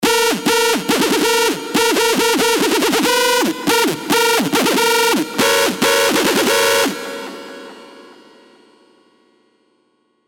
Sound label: Screech; Screech Subject; Revealed Serum 2 Rave Asylum